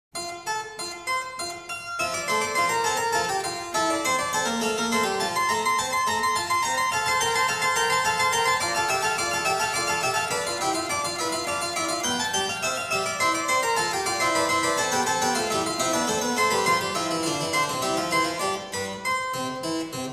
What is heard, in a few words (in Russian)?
Инвенция Фа мажор — Бах